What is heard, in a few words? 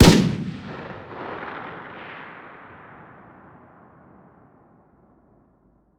fire dist; weapons; m16; Urban-Coalition; Decay; arccw ud